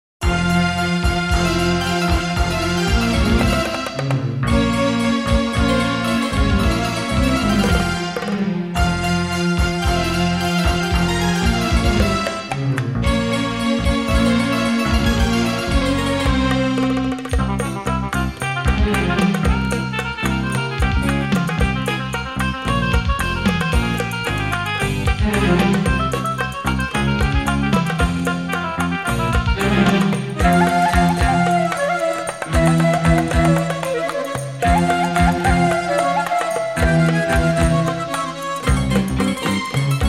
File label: Instrumental Version